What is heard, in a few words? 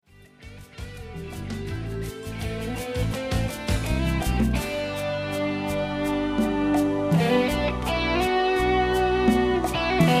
samba